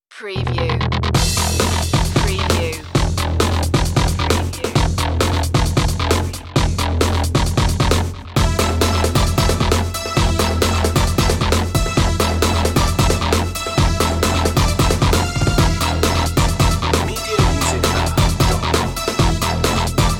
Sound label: urban jungle